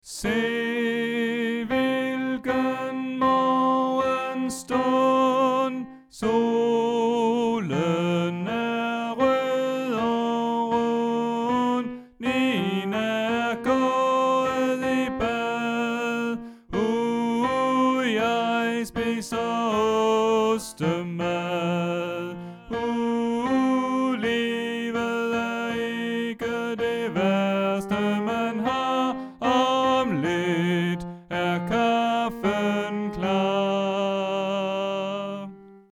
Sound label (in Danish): Tenor; oevefiler